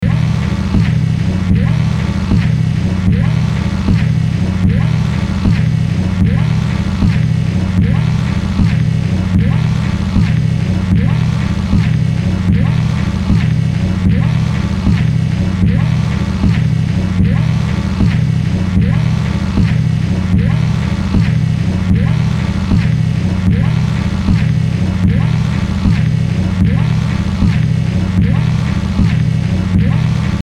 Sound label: dark energy beat